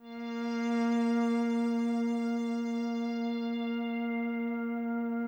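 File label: strings, Hip Hop and R&B Drumloops; Best Service ProSamples vol.01; AKAI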